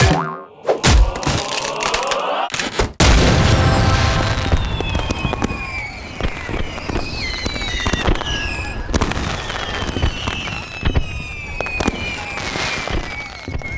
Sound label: item crate smash ultrarare fireworks